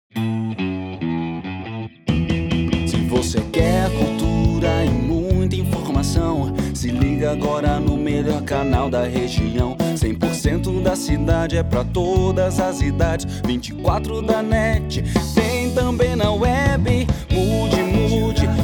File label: JINGLE TV; CONCEITO